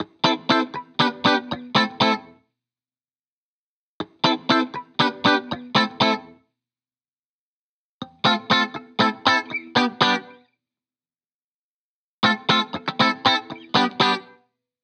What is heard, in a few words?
Guitar Bpm120 Key A minor, 70s Guitars; Fox Samples - Back to the 70s Guitars